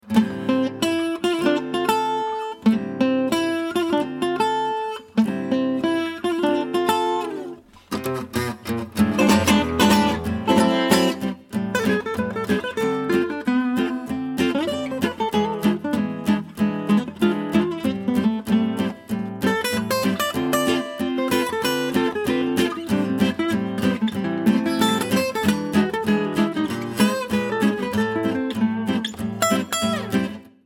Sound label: Swing